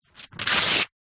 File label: household book telephone directory page thin rip out